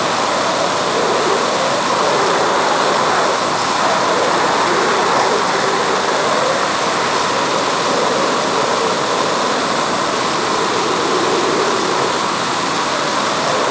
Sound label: Aircrafts Engines Mig15 Front Engine; DCS